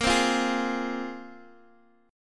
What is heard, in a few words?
Bb 7th Suspended 2nd Bb Guitar Open D; x,x,8,6,8,6